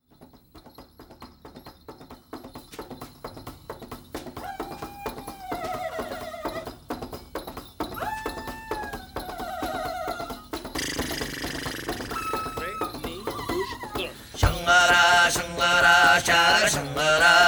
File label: Jingle Bells